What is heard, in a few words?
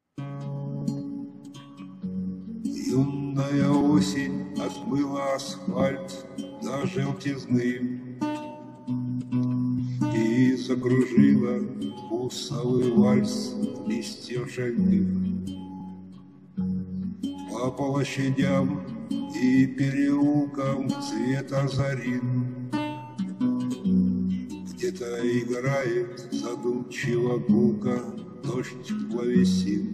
vals; busovyi